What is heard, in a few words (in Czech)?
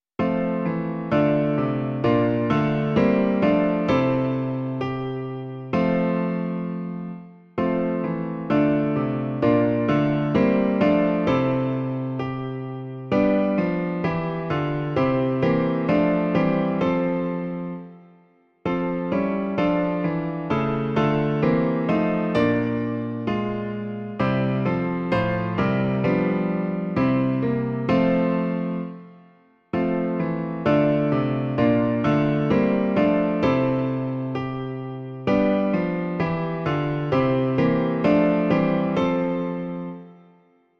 Slyš mě, Bože - klavír; Klavírní verze